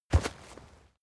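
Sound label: step leaves wet heavy, footsteps; schritte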